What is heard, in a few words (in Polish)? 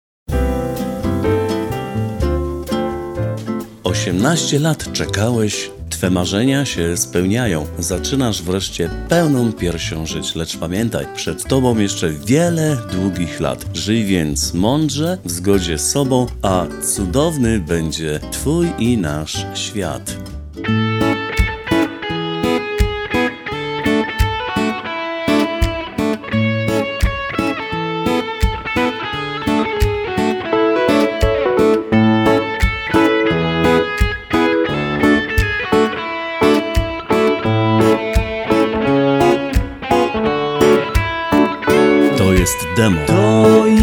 osiemnastka samba dla niego